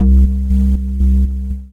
Pez Noise, Analog Bass; Ableton; Retro Synths; Analog Bass Vol 2

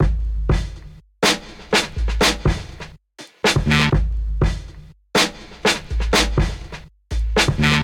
Drums, breakbeats; Uprockable; Ableton; Breakbeats by KutMasta Kurt

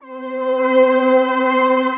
Pad; Pathos; Zhalda; Ableton; Glitch and Wash

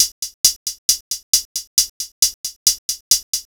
135 House Electro Hi Hat; Dance DJ; Drums

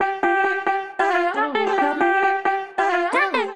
Sliced Melodies D Minor 135 bpm; MIDI Clips; Ableton; Factory Packs; Voice Box; Harmonic Racks

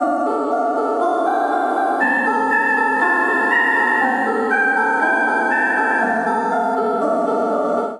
Chromatic Granular Voice D Minor 120 bpm; MIDI Clips; Ableton; Factory Packs; Voice Box; Transformed

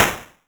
LoFi dist snare; Loafa; Ableton Foundation FreePacks; Wicked Kits

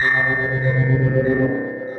effects; Gnarls Smartly; Ableton; Factory Packs; Punch and Tilt

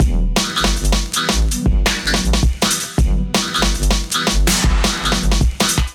Kit Melodic 161 bpm; MIDI Clips; Drums; Pico Dilly Kit; Ableton; Factory Packs; Skitter and Step

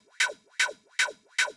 152 Techno Hats Fx; Dance DJ; Drums

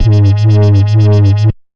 Sine Dubby, Waveframe Bass; Ableton; Factory Packs; Retro Synths

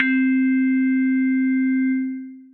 lo-fi FM EP, mallets; Ableton; Factory Packs; Chop and Swing

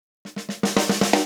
120 NuJazz Snare Intro

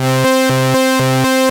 Octave Jumper, analog synth rhythmic; Instruments; Synth Essentials

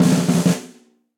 Snare Press Deep Snare Dynamics; Snare Articulation; Drum Hits; Session Drums Club; Standard Hits; Ableton

